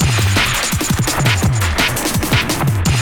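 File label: drum loop; X-Static Goldmine 3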